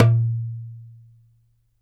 DARABKA, Mediteranean Traditions percs

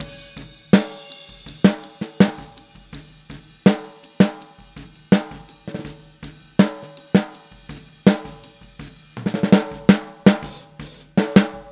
Boogaloo Fill Snare, 82 BPM; Sonic Foundry; Drums from the Big Room The Mixes

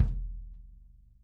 L KICK 2 P 2 R, Interactive Drum Kits; Sonic Reality

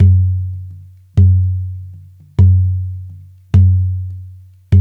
100 Haitian Congo

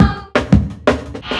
JUNGLE, Big Beat Drumloops

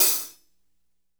hat slosh

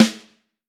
Vintage Snare Drum; 5X14; Q Up Arts; Johnny.C's Vintage Snare Drum

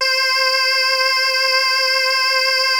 WAVETABL1 C5; Music Store - Claudius Diemeter Schwerlos; DIG MYSTICS

